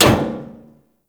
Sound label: Ship Hit; RailJack Hit Effects